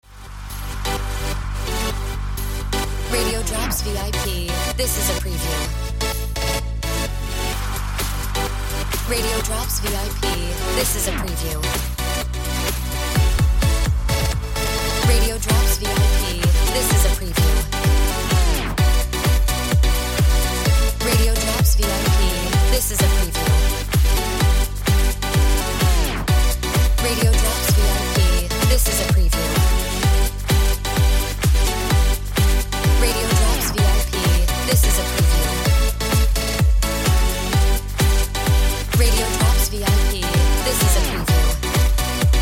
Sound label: Music Bed